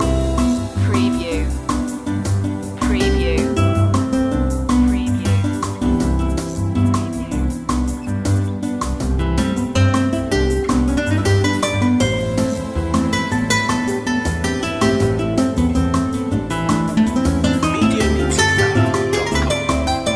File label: warm place loop